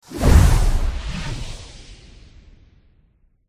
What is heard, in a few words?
skeleton